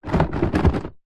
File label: leisure board game shake box; telstrations